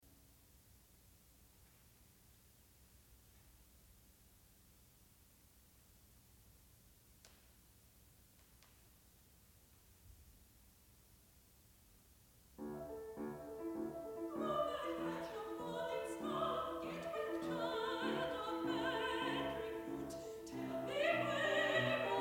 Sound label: English Songs